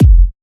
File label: Kick F; Drums & Percs